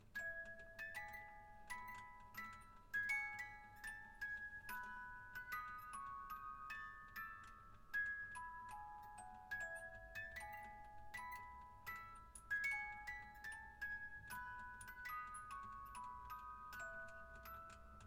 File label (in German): Hymne Poland